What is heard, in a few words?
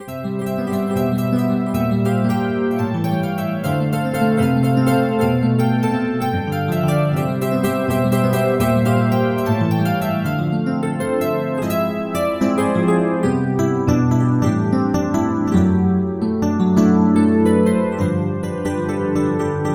epiano